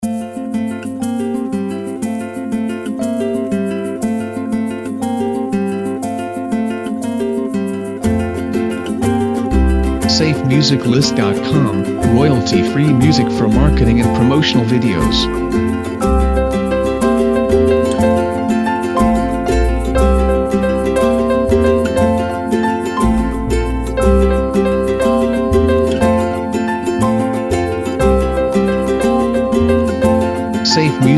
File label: Sea Breeze Loop